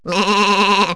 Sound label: dad sheep; animals; Wired for Sound Pro CD; Aristosoft, Inc.